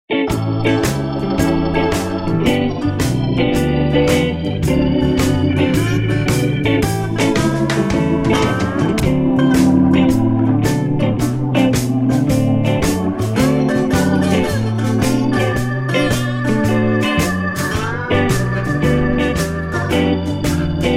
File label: Practice Song Royal Flush Blues, flutist; chapter 5 using the whole tone scale in improvisation